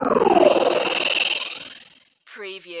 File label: Magical Chopper Swirl